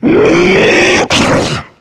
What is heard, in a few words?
melee, voice attack; video game; source engine; left 4 dead 2; charger